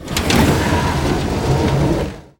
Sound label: furniture slide; estate; Call of Duty Modern Warfare